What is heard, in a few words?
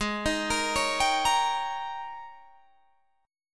Ab Major 7th Suspended 2nd Suspended 4th Ab, down, Guitar, Drop D